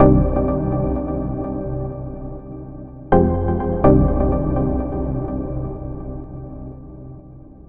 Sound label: Dub stack; Сэмпл синтезатора (Minimal-Electro); 125 BPM; Synth; минимал; электро